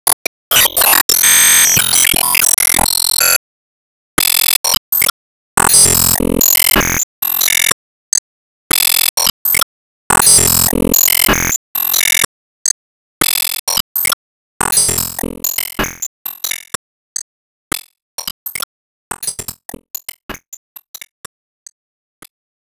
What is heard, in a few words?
Se Gacha Intro Rainbow Loop